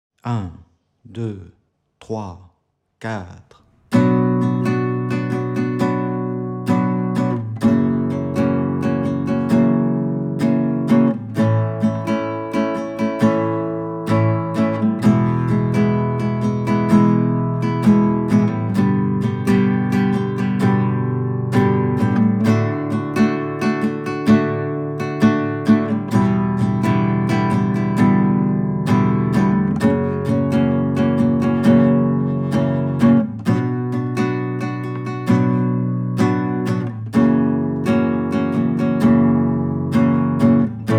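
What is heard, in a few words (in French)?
65bpm, guitare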